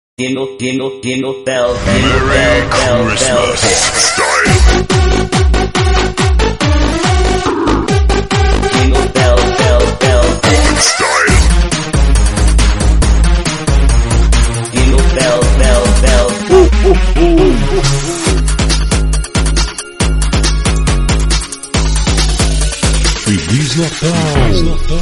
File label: beats jingles xmas